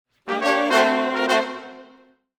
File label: Brass, Jazz for piano